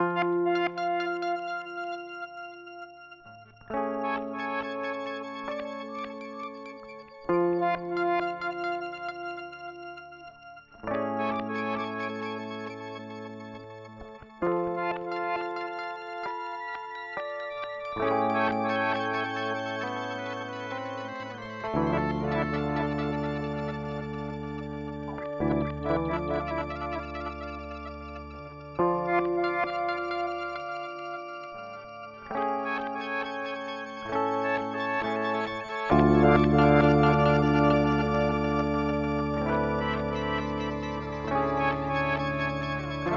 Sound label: Eventide H9 Dark Delay